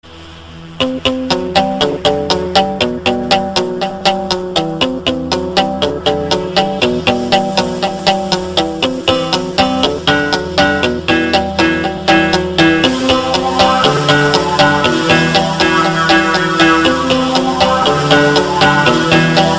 new simple ring, ringtone

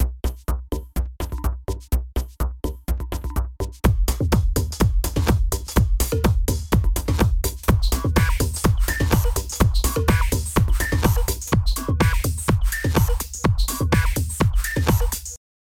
robotic tone

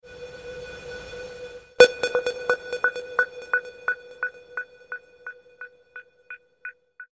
trance message

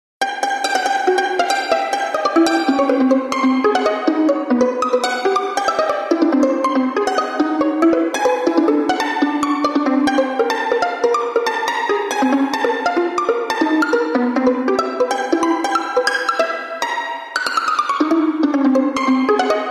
crazy violin

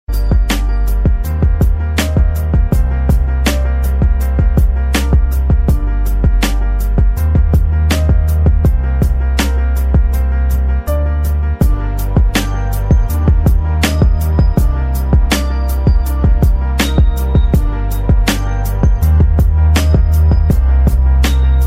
suspense beats

